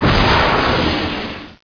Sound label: DEBRIS